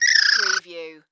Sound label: Alien Chirp Critter; sound effects bible